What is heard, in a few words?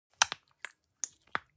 gel dispenser press squish, badezimmer; haus garten